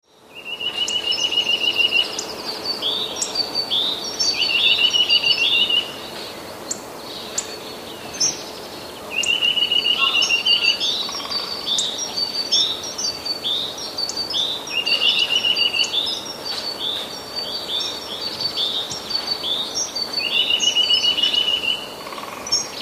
kowalik; Laskach

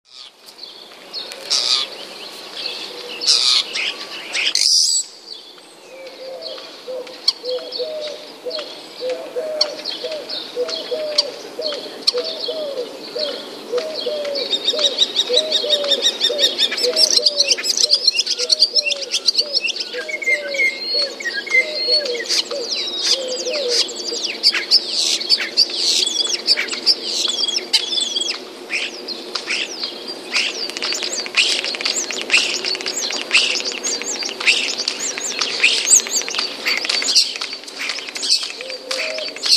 szpaki, przykład szczebiotu szpaków. Na nagraniu słychać daleko pohukującą sierpówkę; wroblowe; zapozyczenia

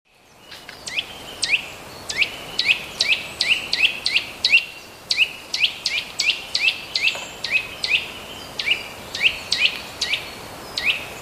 kowalik szczebiot, szczebiotu naszego kowalika, który wykonywany jest w wolniejszym tempie niż w przykładzie poprzednim

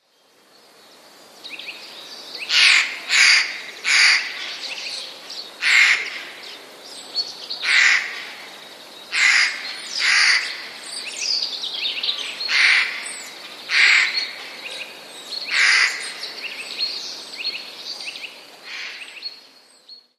sojka; głośno kracząca sójka

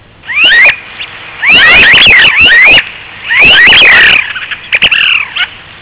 Świstun Chilijski - Anas Sibilatrix; wroblowe; anseri; anatini; głosy innych kaczek właściwych

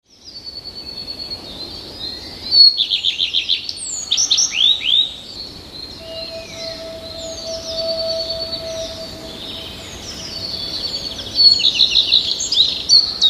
pleszka, 3 piosenki. W końcówce drugiej piosenki można usłyszeć śpiew pierwiosnka; wroblowe; zapozyczenia

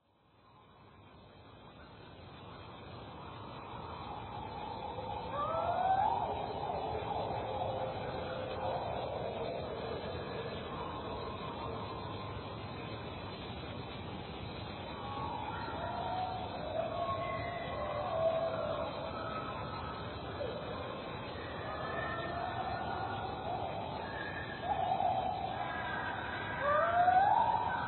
pierwiosnek, nagraniu 4-krotnie zwolnionym i obniżonym o dwie oktawy; nawoływań i śpiewu, zrobione na dużej łączce 30 maja 2021 roku; między piosenkami słychać ochrypłe

